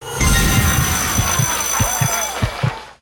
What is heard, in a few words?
big win; Fruit Express; slot game